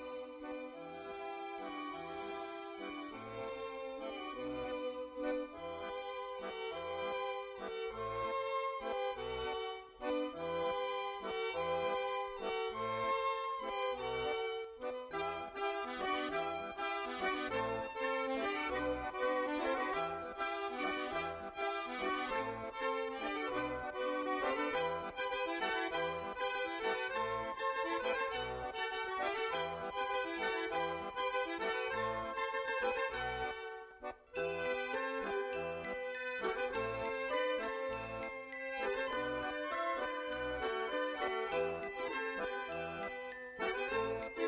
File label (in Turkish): santral bekletme muzigi fon